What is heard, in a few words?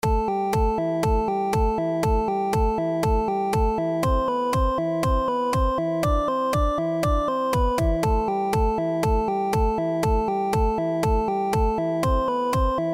Chiptune Test